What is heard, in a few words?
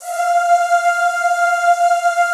ZZZ F4, Classical Choir; Best Service; Extended Classical Choir